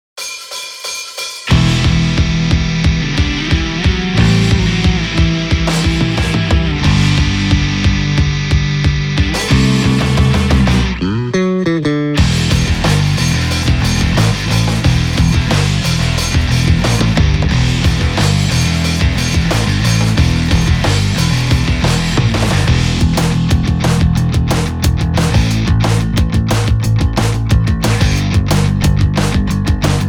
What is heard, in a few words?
ROCK Ampeg SVT 400T